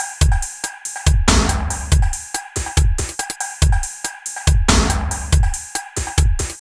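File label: drum loops, right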